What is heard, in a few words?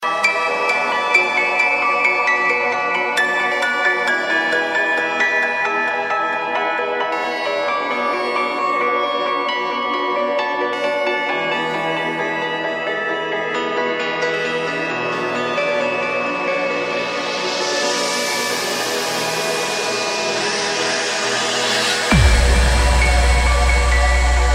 Piano Trance